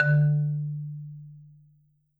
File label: D2, Marimba; hard F; Sampleheads - Dave Samuels Marimba & Vibes